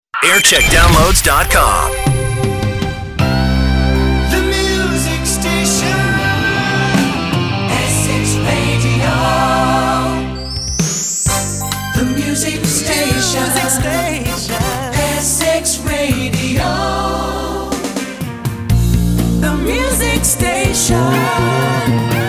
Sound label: jingle montage; essex radio